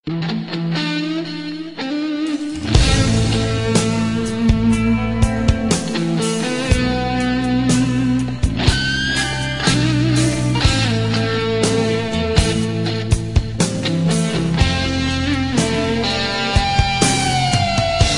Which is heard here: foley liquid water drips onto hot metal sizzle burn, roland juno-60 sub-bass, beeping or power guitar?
power guitar